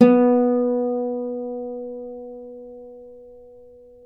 Celtic Harp, Plucked Strings

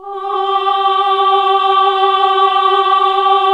HAL AAH, Nuns Aahhh

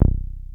303 C1, TB 303; Hollywood Edge; Giorgio Moroder Rare Synthesizer Collection

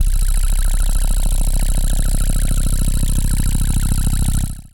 CRICKETS, Anime Sound FX, SCIFI

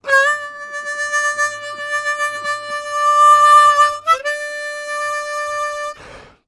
Harm 129bpm G, Harmonica

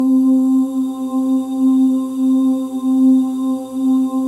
BGV OOS; Spectrasonics; Symphony of Voices

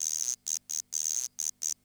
CR78

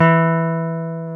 Jazz Guitar; Club-50 - Foundations; Jaz-Kessel

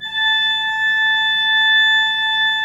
Pipe Mix Celeste, 3D Organs; E-MU Producer Series Vol. 5 – 3-D Audio Collection

